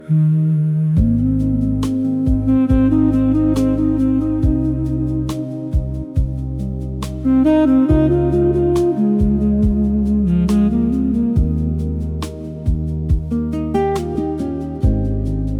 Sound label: happy vibes ringtone; Yodelling; (यूडेलिंग)